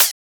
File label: Closed Hat; FLPRO